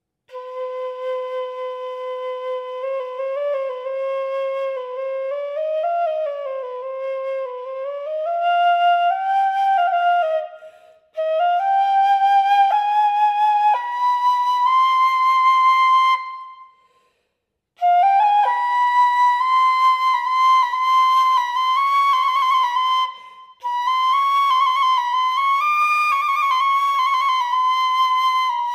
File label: Arabic flute; فلوت عربی